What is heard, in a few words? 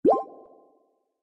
heart